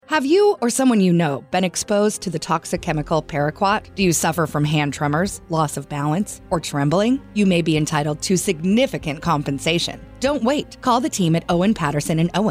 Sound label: paraquat music bed; KFYV OPO LAW